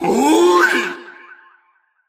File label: uuui